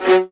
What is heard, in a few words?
ATT F#3, LOUD VIOLINS; Q Up Arts; Denny Jaeger Master Violin Library